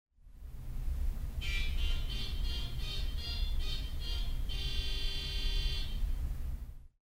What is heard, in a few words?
Kinura, theatre organ